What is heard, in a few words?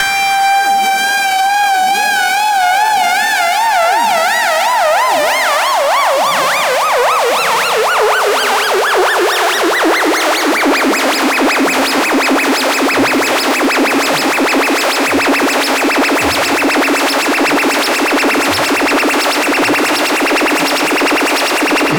Riser; neuro; InsideInfo